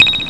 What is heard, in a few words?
CRYSTAL